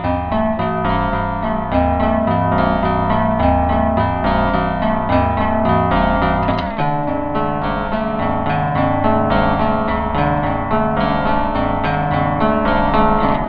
ringmod; Digital Audio FX

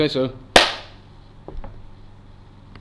impulse; Fourier Transform; MATLAB

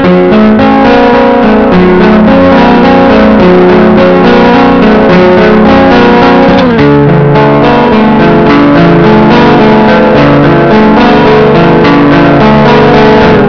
fuzz; Digital Audio FX

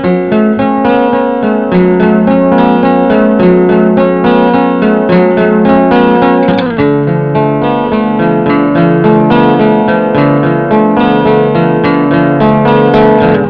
overdrive, Digital Audio FX

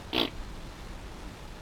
impulse rasp; Fourier Transform; MATLAB

